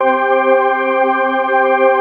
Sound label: dance chord